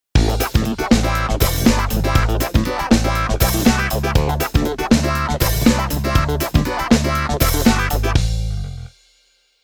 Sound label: Keyboard Clavi Funk